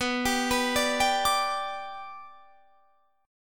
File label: B Minor Sharp 5th, Guitar, Standard; arpeggiated; JGuitar